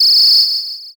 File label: whistle long